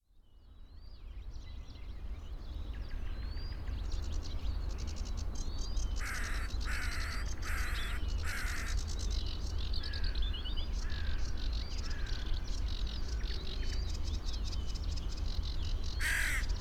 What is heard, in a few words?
crows; sergiyev posad